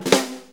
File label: 4-4 Snare Flam, Drum Loops; Drum Tools; Flams